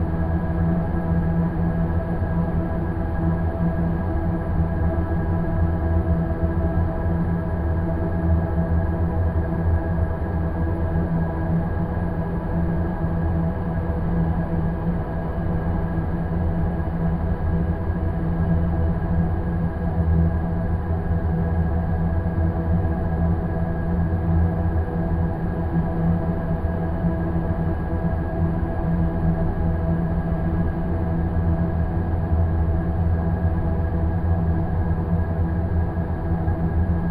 engine room ambient

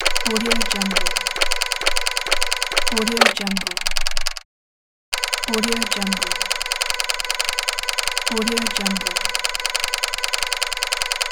conveyor mechanism

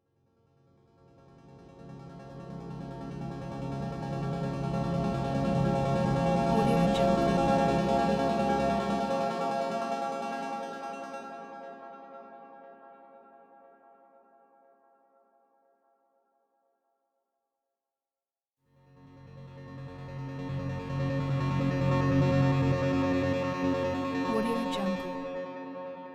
ripple logo

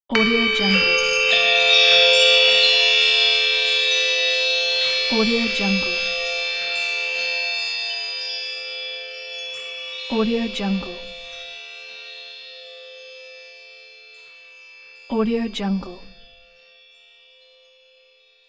old enchanted forest

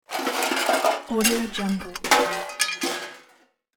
search through junk pile; hollywoodedge

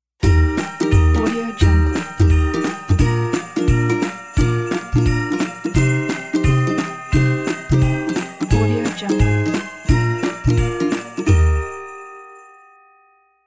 christmas logo; hitslab

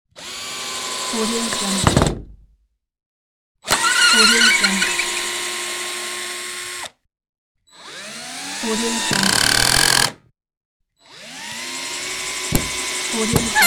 screwdriver

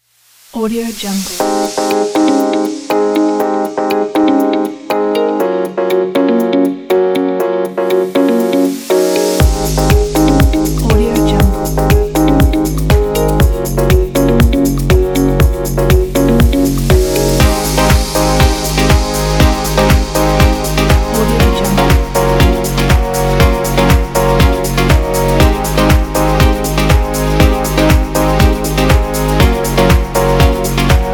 beautiful pack

